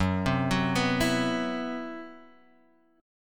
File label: C Major 7th Flat 5th F sharp, chord